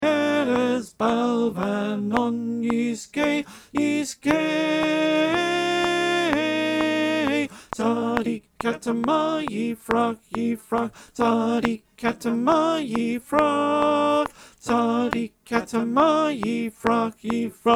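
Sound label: Alto clicktrack, choir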